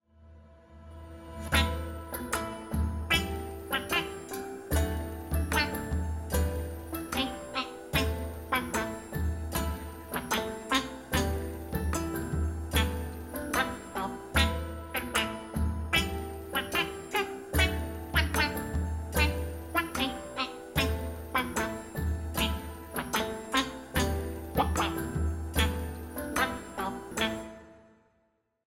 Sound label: shanty; mx tft dragon reward